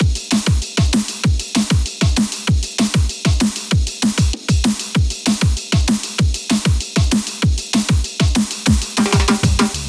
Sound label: DNB BREAKS